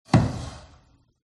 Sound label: wooden chair; fox audio